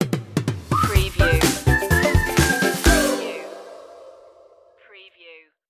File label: tell me more stinger